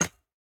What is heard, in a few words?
footstep; smite; osiris